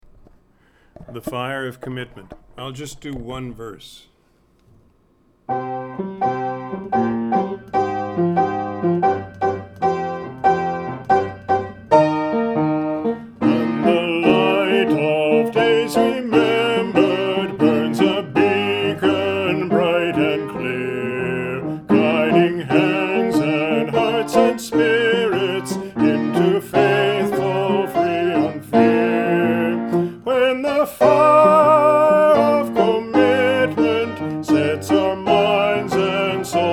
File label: bass, choir